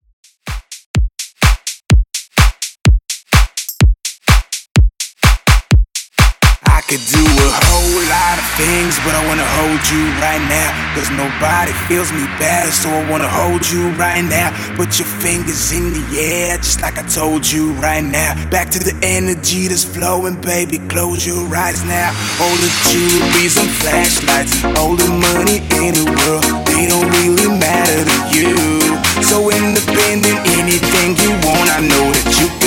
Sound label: Extended Redrum 126Bpm Clean; djyan